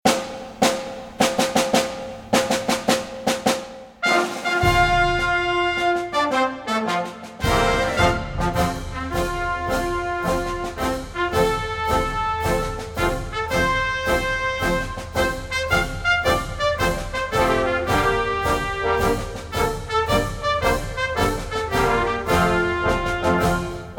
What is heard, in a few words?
anthem